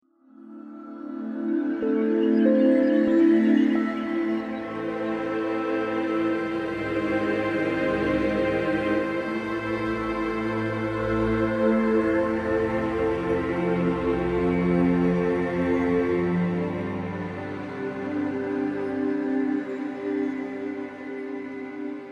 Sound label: Success; assassin s creed; Jerusalem